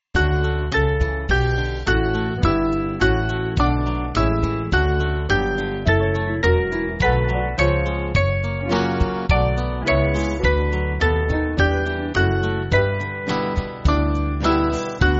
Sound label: God Of Pity, Band; Huddersfield